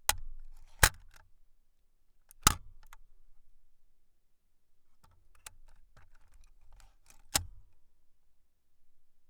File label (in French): OUVERTURE VISEUR LOUPE